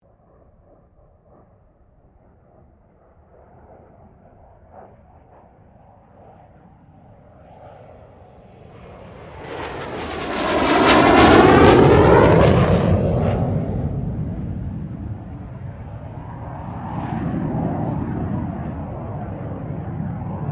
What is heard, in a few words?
AIRCRAFT CA-27 SABRE PASS; CA-27/F-86 Sabre; Airplanes; Vehicles; Aircraft Collection